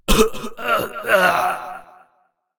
Death Vocals; Chronomancer

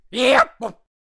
Monkey King Attack Vocals

